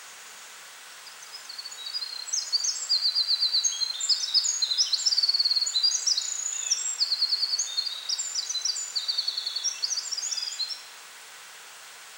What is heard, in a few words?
winter wren; BIRDS